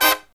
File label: HI CHORD, Brass